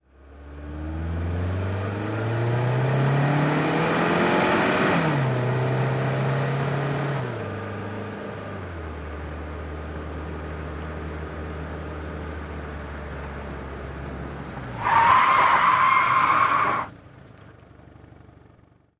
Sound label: Sedan Accel Tire Skd